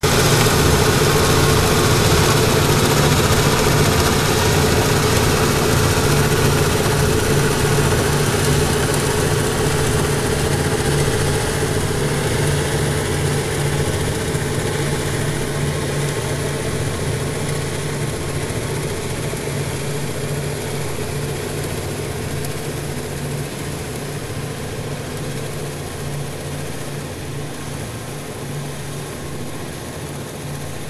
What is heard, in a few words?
Ambient Noise